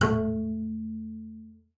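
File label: bass col legno A3; Xsample VOL-5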